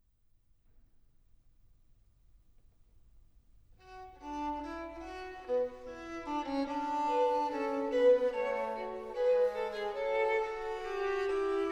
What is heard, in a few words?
Fugue arranged for string quartet in C; Fugue from WTC II arranged for string quartet by Wolfgang Amadeus Mozart K405 No1 in C Minor